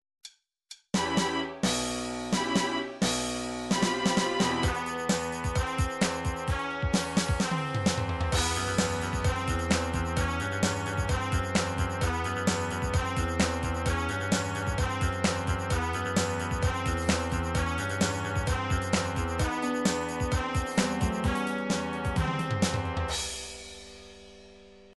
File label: disco intro remix; nintendo 64 goldeneye 007